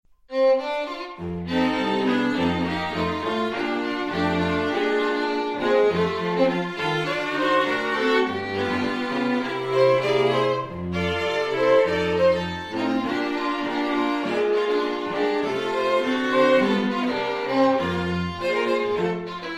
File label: strings